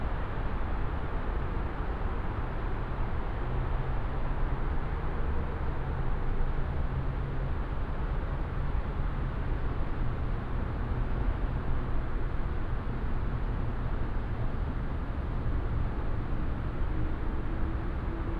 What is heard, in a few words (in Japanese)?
夜の郊外の環境音 低域カットなし